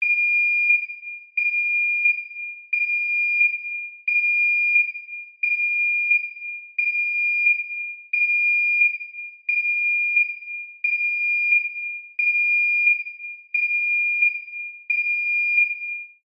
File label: emergency alarm beeps reverb large area; zapsplat